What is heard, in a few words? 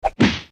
Karate Chop